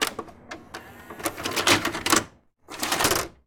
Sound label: VCR Eject Tape; SFX Kit; Foley - Misc